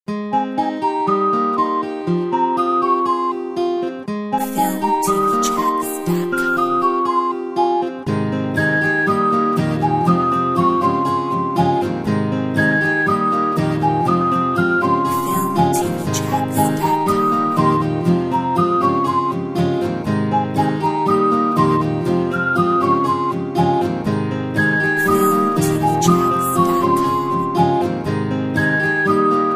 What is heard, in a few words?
happy day gtr whistle